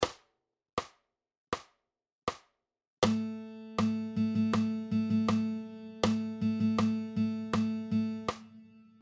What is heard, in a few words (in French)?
Dictées de rythme, guitare